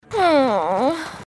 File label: groan; pony; Twilight Sparkle